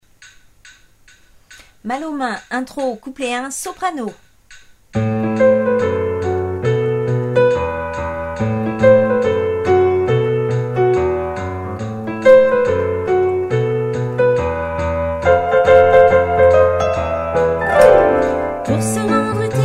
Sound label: soprano